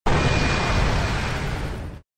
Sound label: speaker sound wave; titan